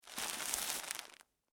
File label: Food Bread Wrapper Remove Single Slice Loaf; Household